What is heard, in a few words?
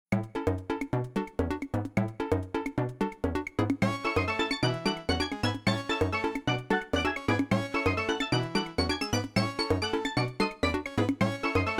Beach BGM; NSMB